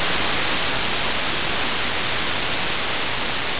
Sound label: rain